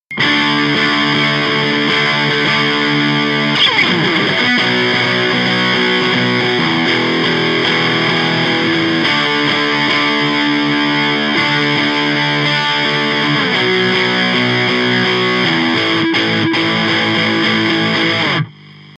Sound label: Guitar 1 - mix non in fase